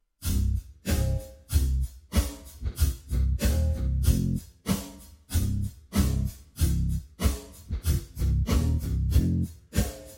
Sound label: clarinet slow